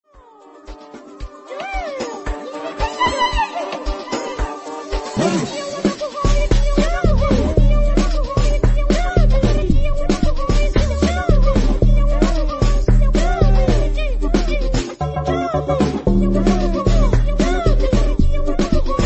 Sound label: AMAPIANO